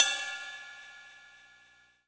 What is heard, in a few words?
Ensoniq SQ-1 Ride Cymbal